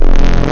Dirty Needle, wavetables, Analog; Massive 3-Analog-Electric

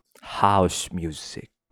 house music

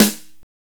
SNR LUDWIG, Snares; Roland - Rhythm Section

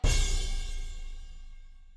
crash, Millenium MPS-400

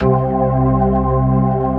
P-ORG C2, POWER ORGAN

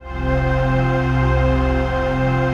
TRANCPAD; Best Service; XX Large Pads; Sample CDs

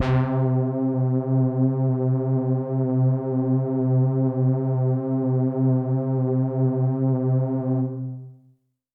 Pad C, Synth Prophet One Shots; Electronisounds

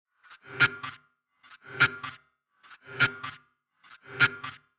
Side chain gated Topline (100BPM); musicradar

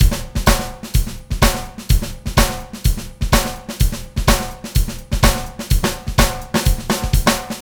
SAMBA, 126, Dance Trax loops

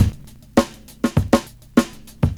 70s Breakbeats; USB Soundscan vol.46; AKAI

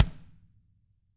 TAM Double Platinum Drums; ILIO; Double Platinum Drums 2; TAMBKIT

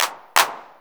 Roland Tr-909 handclap

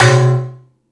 Jungel Percussion, E-MU Pro-Cussion

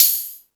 High Hat, Drum Machines E-MU Pro-Cussion

